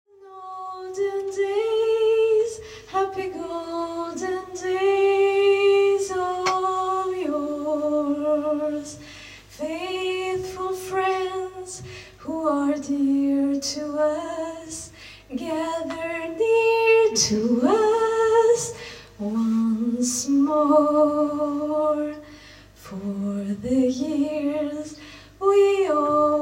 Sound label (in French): Chanson Noel A Cappela